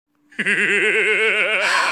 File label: Laugh longer; Alucard